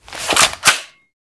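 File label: heavy machine gun draw, weapons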